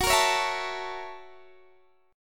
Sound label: F sharp 7th Sharp 9th, Guitar, Open D